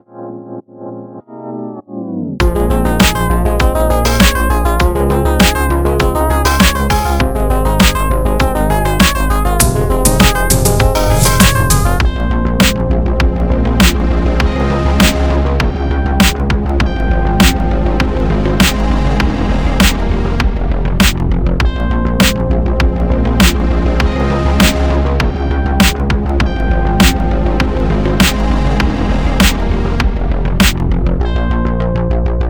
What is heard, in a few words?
bgm